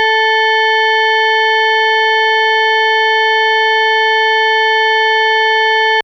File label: FM Sawtooth DX100 A4